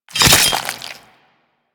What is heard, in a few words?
Dagger Slice Flesh; Dual Daggers; Hit Effects; Weapons